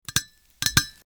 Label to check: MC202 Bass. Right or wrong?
Wrong